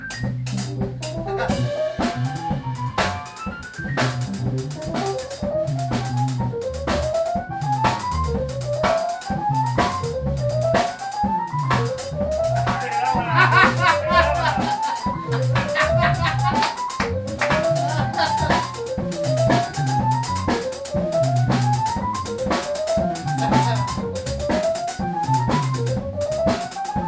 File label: Arpeggijam